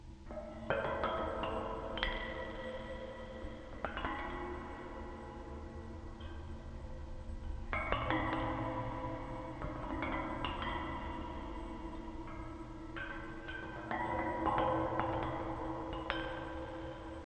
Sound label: water; ウォーターホーン01; 不穏でミステリアスな音 Waterphone